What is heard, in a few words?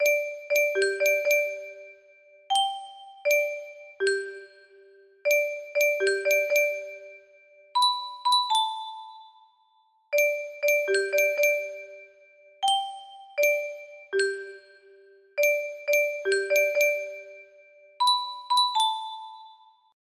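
music box melody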